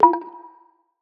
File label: block session perc; eddyflux